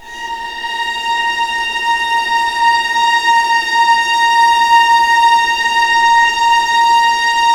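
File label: A#3 legato mezzo forte violas, string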